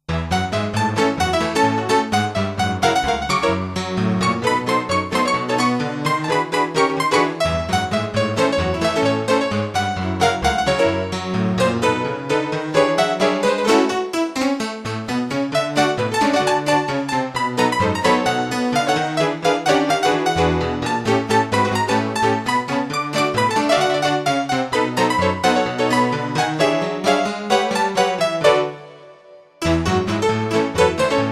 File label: Ragtime